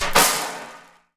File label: ocean drum